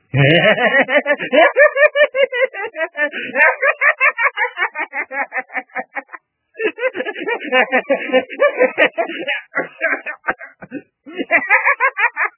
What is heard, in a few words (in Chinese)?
魔性笑声; 熊二